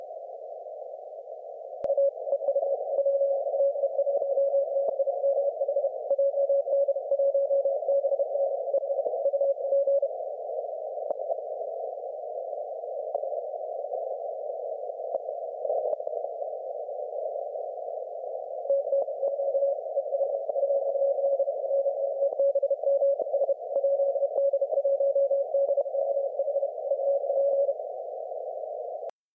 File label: 40m LP